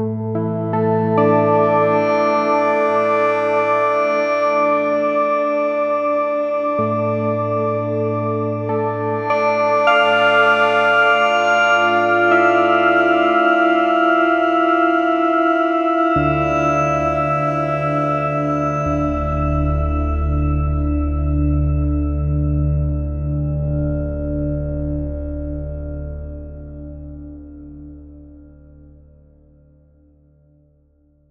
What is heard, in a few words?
hybrid dark key; porphyra hybrid; ocean swift synthesis